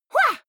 female fight shout attack short; vocals; comedy-cartoon; soundpool

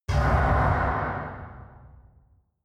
metal large hard

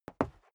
step dress boots concrete single stair down; Footsteps; schritte

